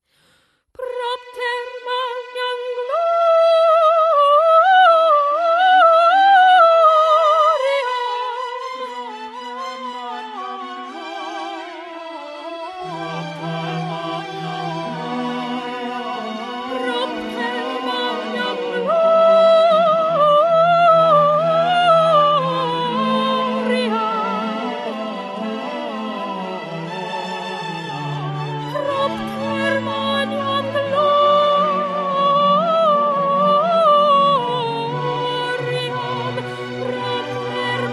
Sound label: propter magnam soprano